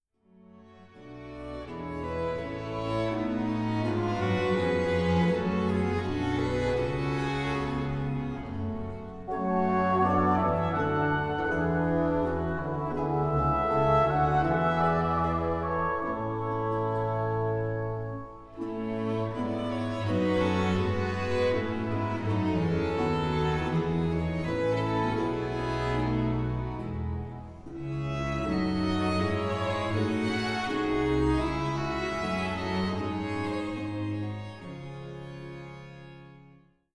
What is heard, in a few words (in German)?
Drum